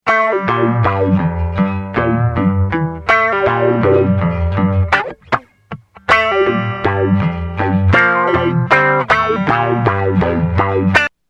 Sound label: Guitar Tube